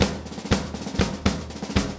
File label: 120 March Fast Drums; Pop Rock